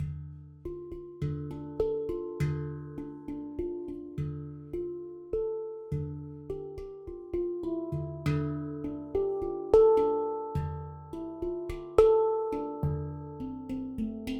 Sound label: handpan mantra